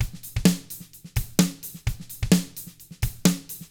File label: HRDRK, hard rock drums; ILIO; Double Platinum Drums 1